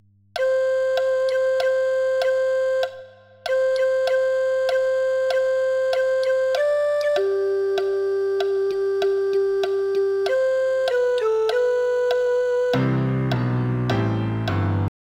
soprano 2-2; Gaudeamus

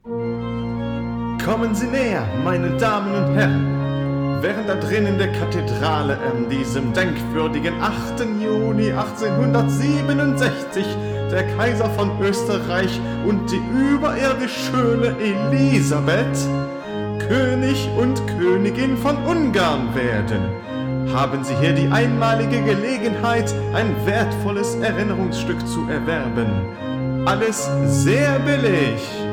Backing Track with german lyrics

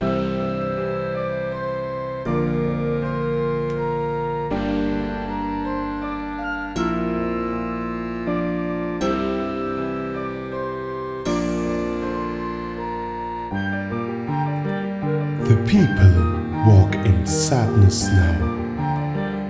Ouverture Act II voiceover

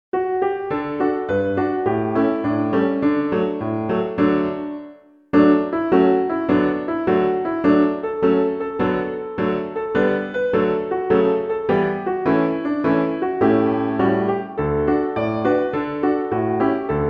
Wassailing Piano

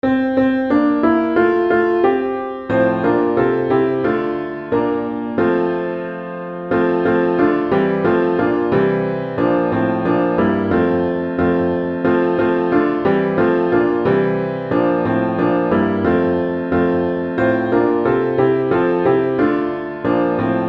Wencelas Piano

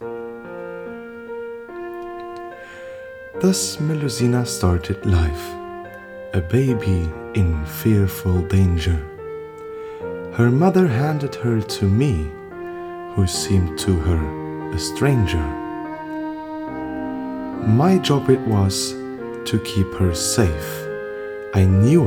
Lullaby with voiceover

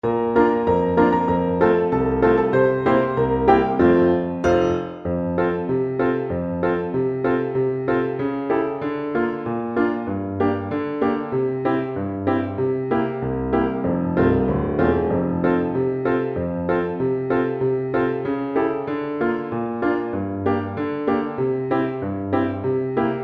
Jingle Piano

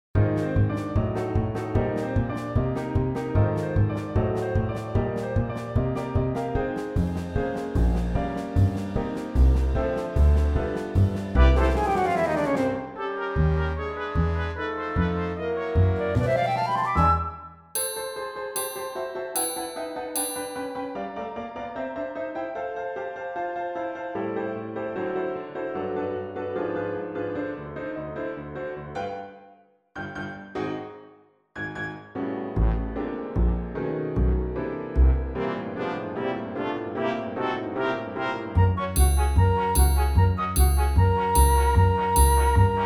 orch

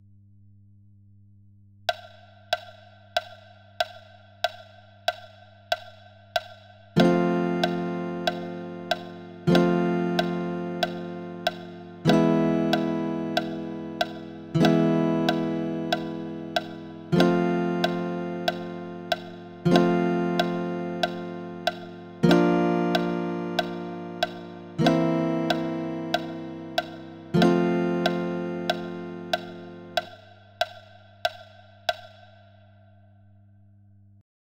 Incidental - Guitar (+click)

